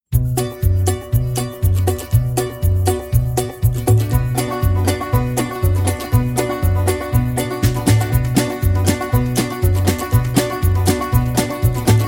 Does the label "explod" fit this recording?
No